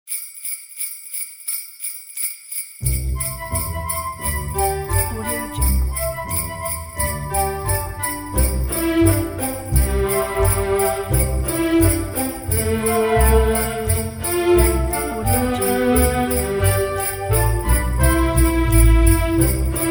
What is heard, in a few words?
jingle bells christmas orchestra